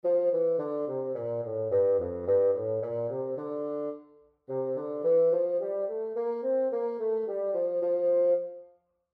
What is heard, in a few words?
Bass Clef Example